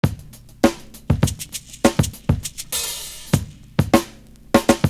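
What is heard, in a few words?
ATMOSPHERE, 100 bpm; Univers Sons - Kitch 70s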